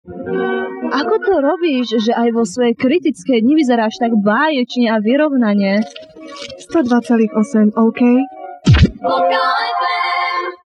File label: jingle; localfm2